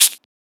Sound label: Shaker